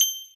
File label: coin Collect